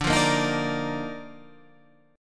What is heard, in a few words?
Eb Major 7th Suspended 2nd D